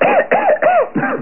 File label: cough